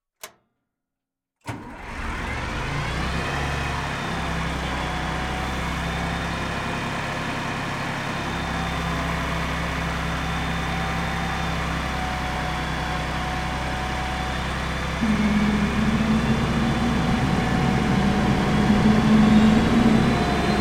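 Bandkanon 1C - ONBOARD - SLOW - Start Drive Stop Reverse Stop Drive Stop Off - ENGINE, Artillery Vehicle; Pole Position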